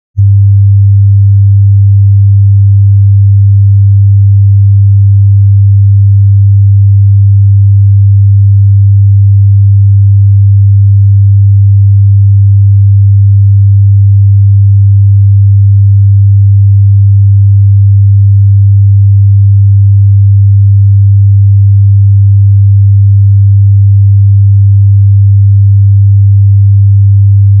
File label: 100hz Sine Wave 0db L+r; Denon Audio Technical CD